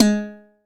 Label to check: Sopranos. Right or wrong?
Wrong